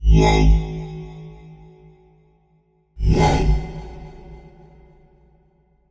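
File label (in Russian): вау синтезатор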